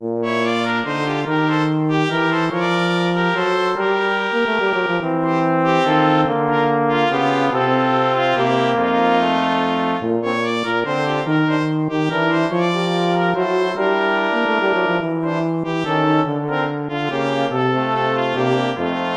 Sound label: Waltz